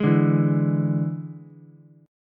D Suspended 2nd Flat 5th D Ukulele; 1,2,0,x; strummed

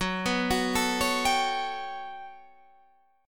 G Major Sharp 11th F sharp, chord

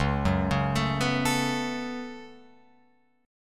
Db 7th Suspended 4th Sharp 5th Db Guitar Open F; JGuitar

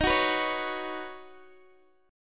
D sharp Minor 7th Sharp 5th, Guitar, Open D

